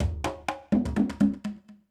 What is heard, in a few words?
PERCUSSN ETHNO 125, Drum